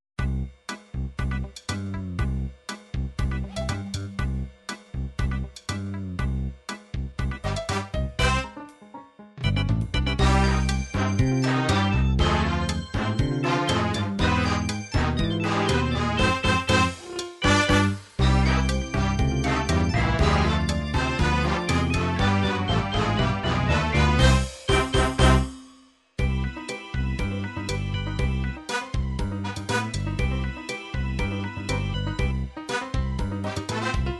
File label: cha cha, midi